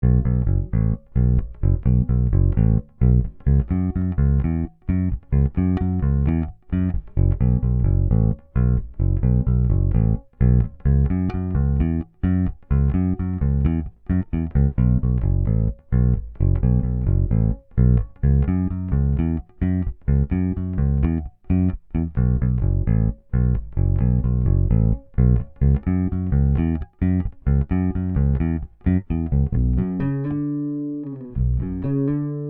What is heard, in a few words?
big ies bass